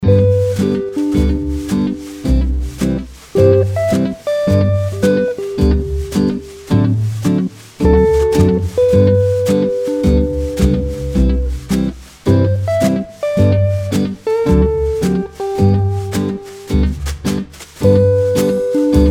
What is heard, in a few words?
music prairie song; Garfield Survival of the Fattest; Android